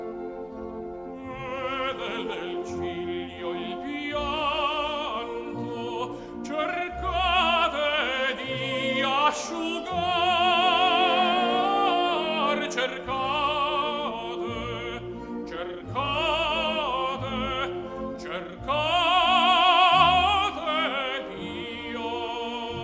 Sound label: tenor